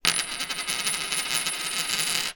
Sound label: foley money coin x1 down on table spin; zapsplat